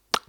Click Sound

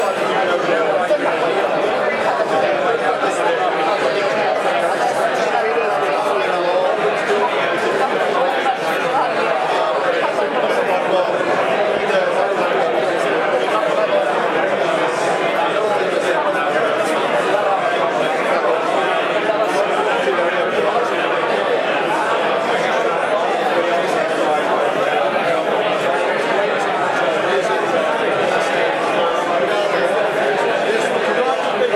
Banquet; Icmc 2011

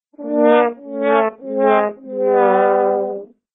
Sadder trombones

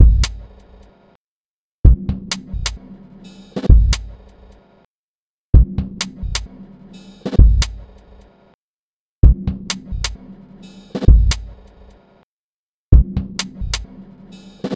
Trip Hop; Runtime

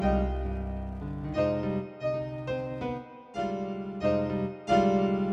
Piano

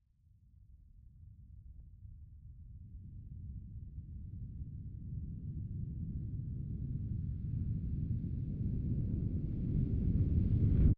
Film Transition

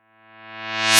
Eep

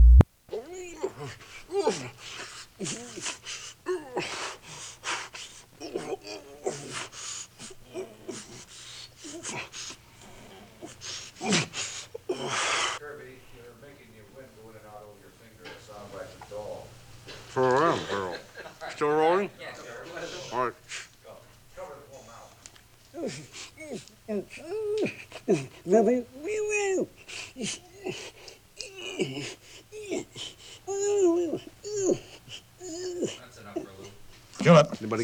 Man Grunts And Struggles

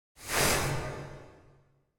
Warp

